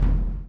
CHAIN; Spectrasonics - Backbeat

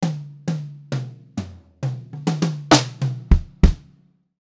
fill, pop-rock drumloops; USB Soundscan vol.37; AKAI

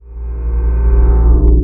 REV, SOUND EFFECT REVERSE

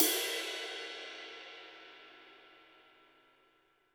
HiHat & Crash, Roland TD-20; HiHats

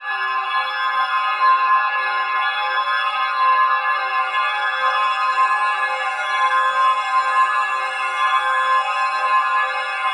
gamelan cloud; Zero-G Ambiosis; Light

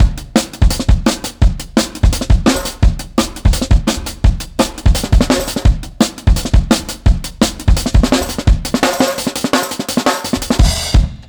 Brown 8 Bar, Drum n Bass Beats; Beats and Percussion; KJ Sawka; Drum n Bass & Beyond

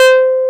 MOOG, Source Bass

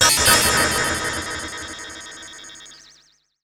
Ring Mod & Delay, contact mic Cymbal; musicradar; contact mic madness

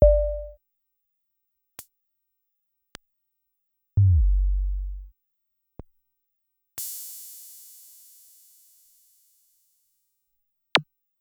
electro; Sonic-Potions LXR

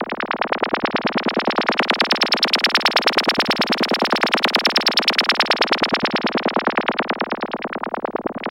rattle sweep; Zero-G Ambient 1; RATTLESWEEPS

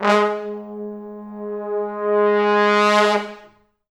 G#2, Pop Brass; Best Service ProSamples vol.19; AKAI

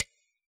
metronome click; AnlgKit1

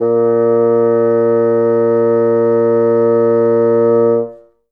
Bassoon A#2; Best Service ProSamples vol.51 - Classic Orchestra 2

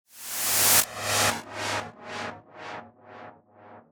Swept Noise

